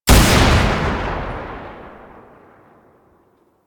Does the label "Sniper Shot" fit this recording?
Yes